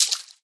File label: footstep water; Scrat; Ice Age: Scrat's Nutty Adventure; PS4; Stadia; Xbox Series X/S